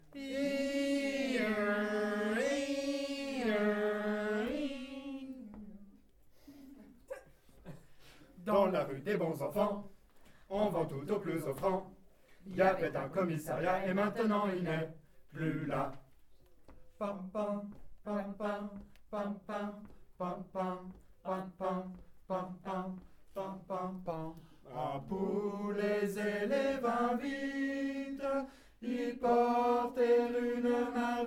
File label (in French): VOIX Tenor